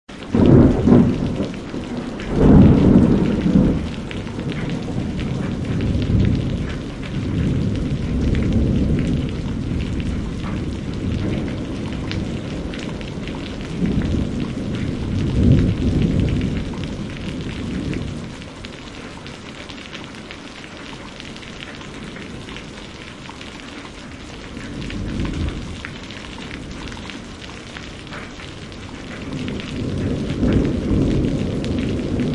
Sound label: rain and thunder